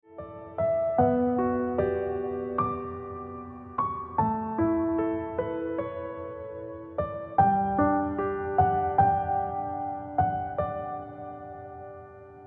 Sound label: Ringtone Piano Music